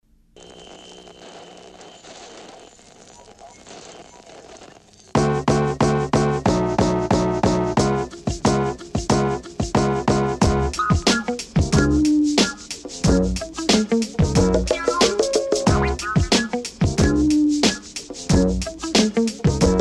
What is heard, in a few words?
apple instrumental